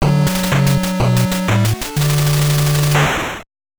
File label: Unused Fanfare; Toxic Crusaders; NES